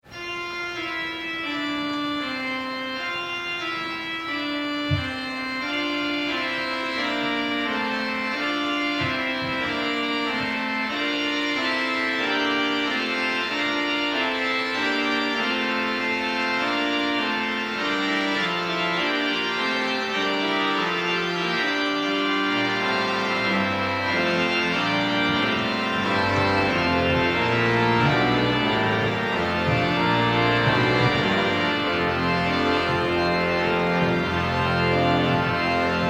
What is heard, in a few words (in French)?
Carillon Ou Cloches, organ; Organ Noels